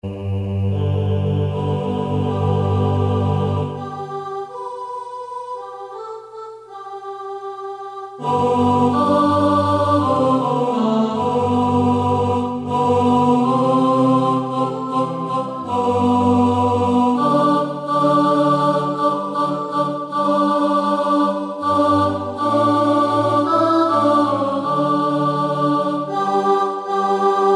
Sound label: ALTO